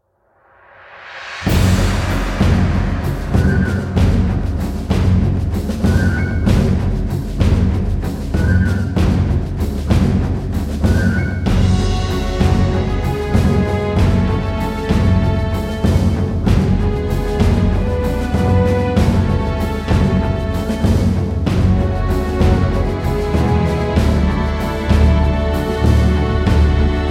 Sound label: prelude instrumental